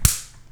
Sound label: Punch, Impacts; HapticSamples; Feel; NiceVibrations